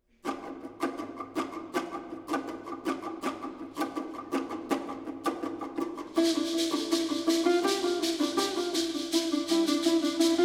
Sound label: Flamenco Style, Balalaika